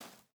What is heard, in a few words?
footstep barefoot dirt jump start; Rust